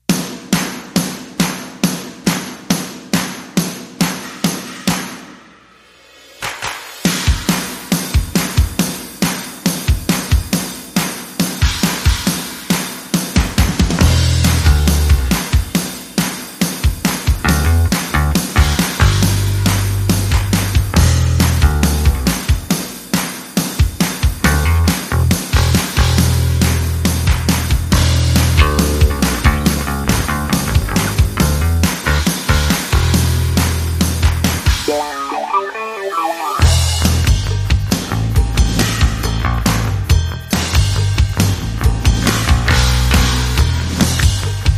Drums And Bass Only

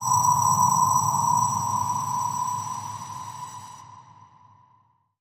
Ping Ghost Detector

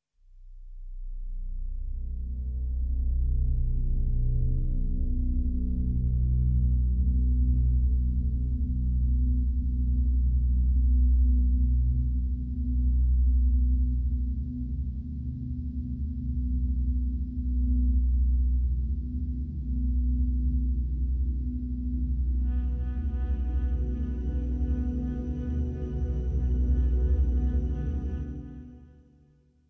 Drones Only

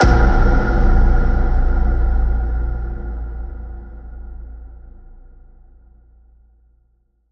Planetary Icy Boom

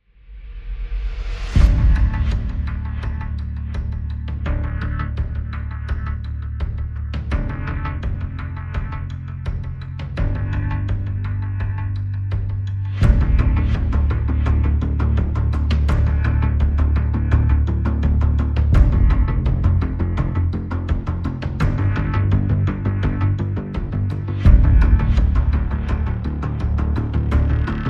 No Piano and No Orchestra

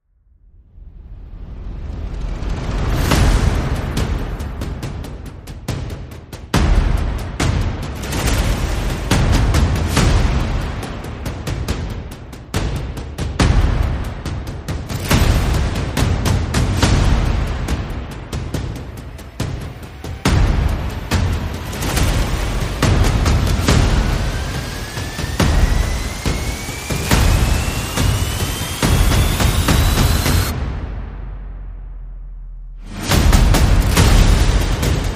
Percussion and Effects

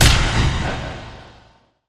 Flat Boom Impact

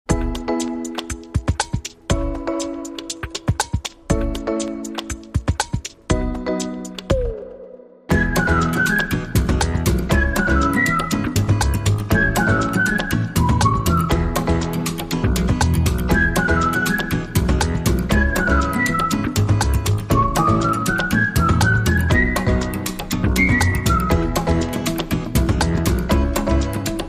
Whistling Jack

